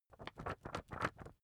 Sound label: magazine wobble soft